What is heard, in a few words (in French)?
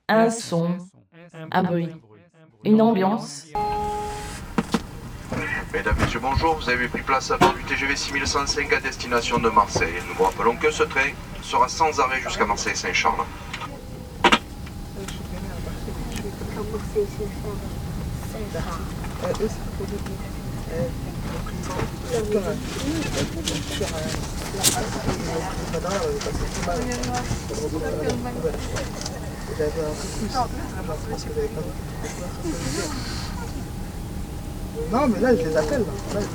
Un son un bruit une ambiance